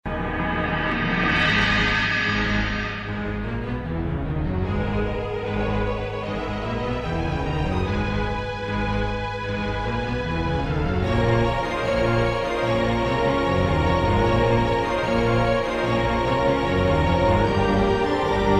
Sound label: confident